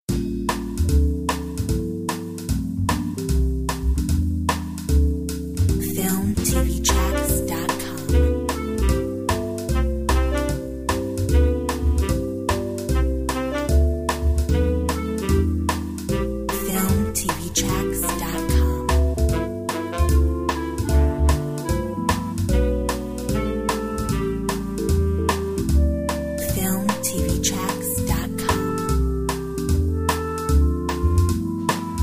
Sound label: My heart beats 150 bpm